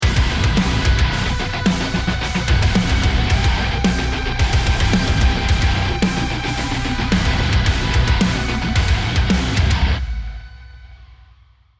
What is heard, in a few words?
round ten sec count, music